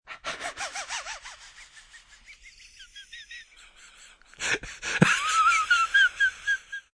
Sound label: Laugh Gasping, Laugh, male, wheezy, breathless (human voice); Laughter Sound Effects